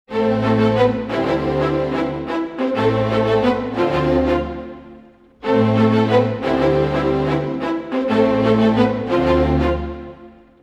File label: 90 a min strings, Orchestral arrangements